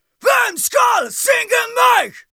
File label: Battle Cry, Viking Vocal, Un-Processed